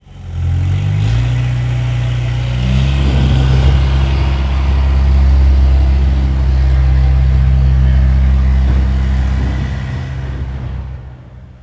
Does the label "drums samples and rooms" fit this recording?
No